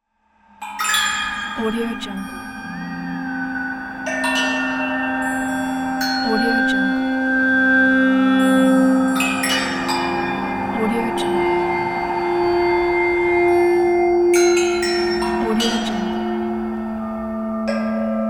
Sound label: mysterious spooky background; applehillstudios